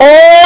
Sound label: ooh